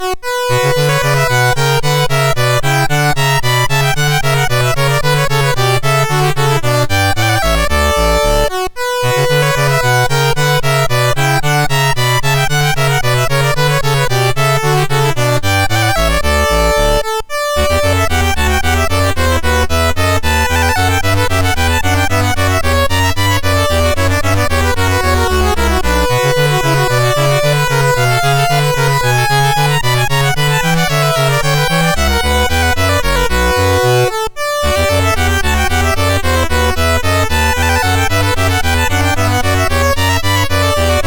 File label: Bouree, MOS6581